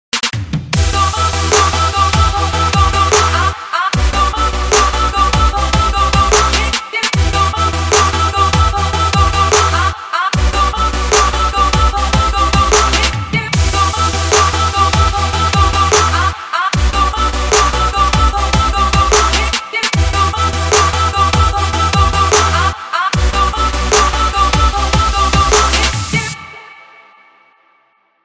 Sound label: Kit Full Loop